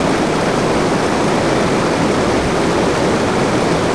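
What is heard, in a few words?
water falls loop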